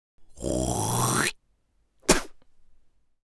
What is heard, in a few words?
Human mouth gargling and spit